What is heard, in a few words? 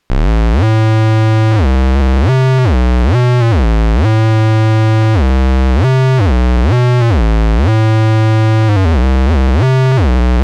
octavius squeezer square, bass